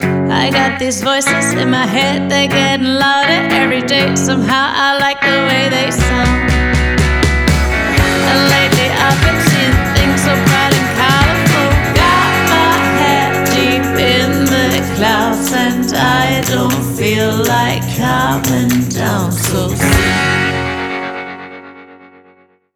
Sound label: Retro Rock